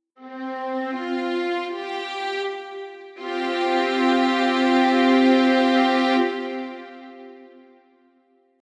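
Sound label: chord strings sus4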